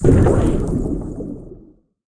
Feature Stacked Wild; rage of the seas

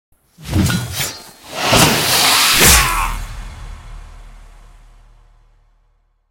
multiplier Strike; conan

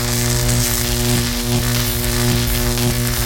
spark On

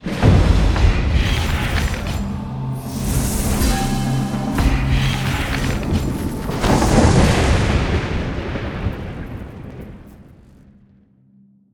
splash Screen Out Feature Splash Animation Start; conan

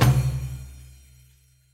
spingle Drum End; wild bazaar

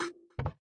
quick stop; twin happiness

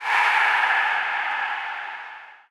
win mega win end; superstriker

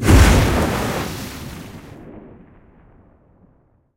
yellow Lightning Strike; parthenon

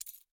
reel Bounce; hellskitchen

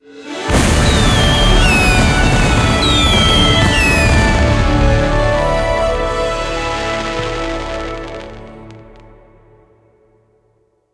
outro bonus game jackpot; fairyred

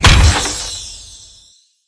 chest Land; fairyred

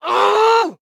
tricorne Girl Win; rage of the seas

